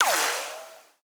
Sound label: bullet ricochet; Rust